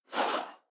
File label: Swish, Alert Tones; iOS Tones; Classic